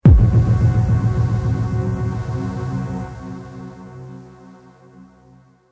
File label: Understated Horror Trailer Hit